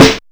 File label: warm sounding snare sample c sharp key; Duration 0:01; Mono; snare drum samples